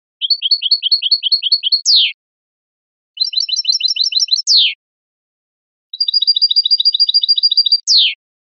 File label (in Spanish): otras cantadas rematadas